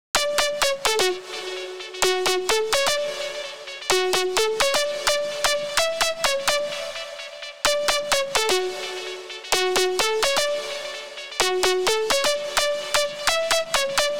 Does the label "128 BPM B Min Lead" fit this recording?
Yes